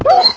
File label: hurt, wolf; minecraft; mob